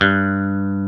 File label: PICKED G4, Classic Guitars; E-MU Formula 4000 Series Vol. 3; Analog Odyssey